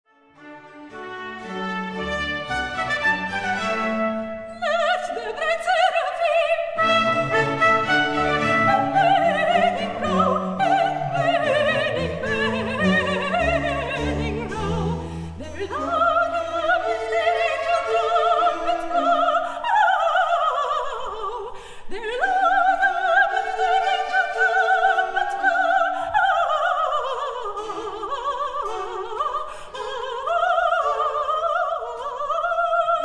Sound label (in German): Kammerorchester